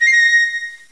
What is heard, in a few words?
pipe